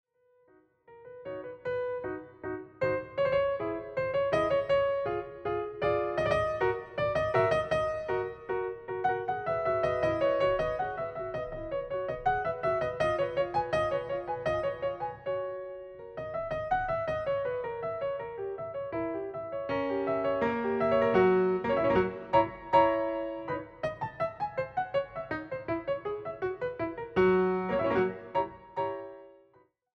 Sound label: Keyboard Sonata in E Major Allegro moderato, piano; Keyboard Sonata No. 37; Hob. XVI, 22; haydn; Piano Sonatas 35-38